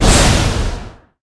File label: skill explo